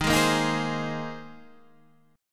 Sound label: C Minor 7th Sharp 5th, D sharp, chord